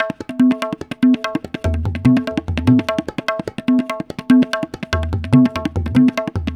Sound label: Tabla